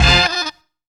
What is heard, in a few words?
FUTURE STAB; Zero-G; Funky Elements